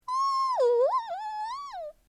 supposed bird noises

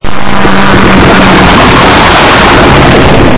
bmw drive by, vroooom

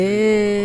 eeeehhhhhhh

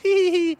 hehehe, giggle

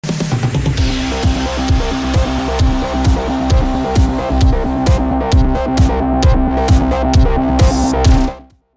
sports intro music

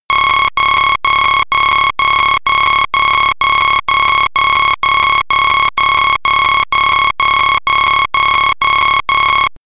alarm clock

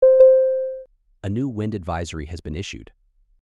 wind; wind advisory

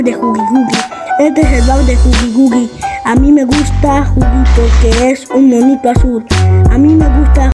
rap; huggy wuggy

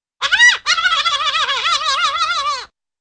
small animal chattering

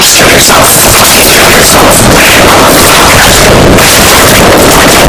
rage

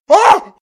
octopus surprised; octogeddon

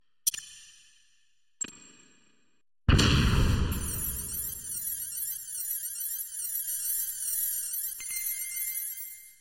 grenade chaff; mgs

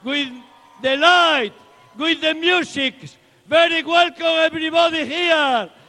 caballero light music

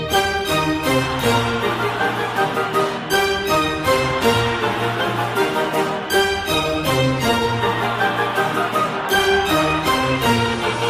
classical music

